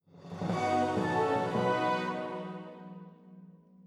jingle quest complete; Sentry Knight Tactics

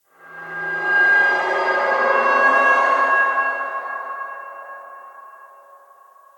Canalisation Amb

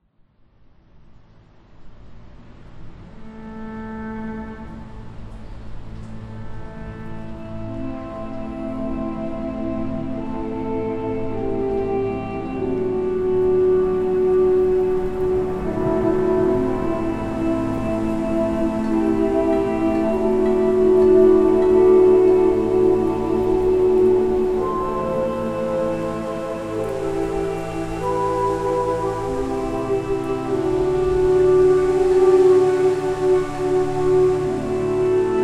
Interiors Fantasy Ambience; Britannic: Patroness of the Mediterranean; RMS Britannic

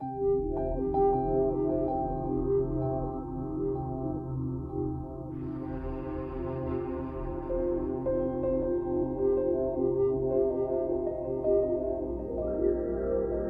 Mayor Inside Ambient; hello neighbor 2; xbox series xs

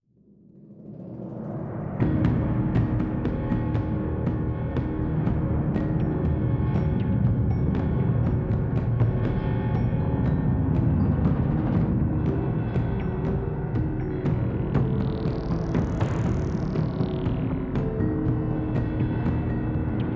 Tension

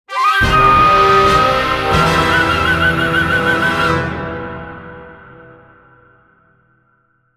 win jingle; Otems Defiance

